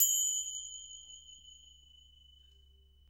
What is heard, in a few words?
TRIANGLE F; AKAI S-Series CD-ROM Sound Library VOL-5